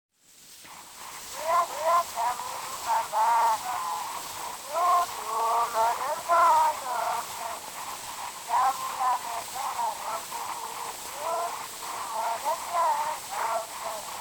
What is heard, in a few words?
Zulu Recordings; Father Franz Mayr; Ihubo lempi